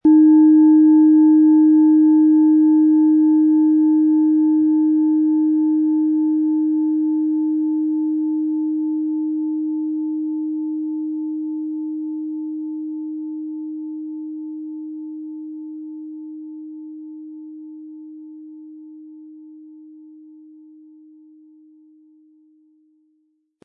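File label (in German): Planetenschale Eros inkl. Klöppel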